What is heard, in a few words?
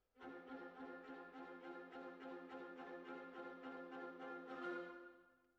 Cellos